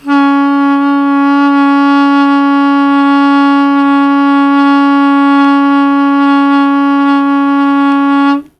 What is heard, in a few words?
Long Sustain Aa C#2, Harmonica; Zero G - Harmonica